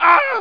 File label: arrg; rashdemo